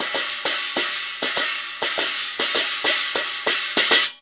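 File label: CHINA LG7 R, China cymbal; Spectrasonics; Heart of Asia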